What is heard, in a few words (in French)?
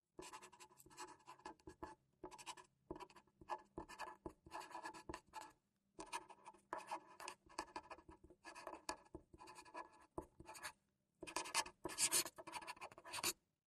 crayon ecrit; COMPAGNONS LUNE ROUGE